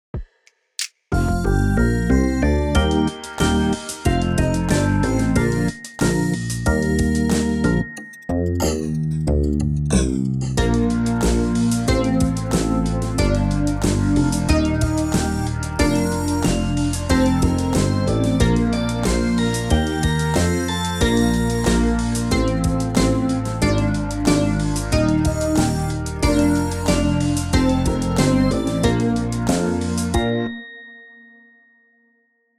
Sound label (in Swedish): Äta glass, trombon trumpet